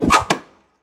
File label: arrow swoosh and impact